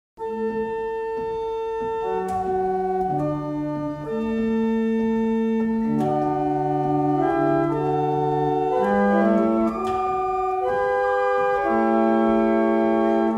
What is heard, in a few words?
Double chant, barrel organs; Wardley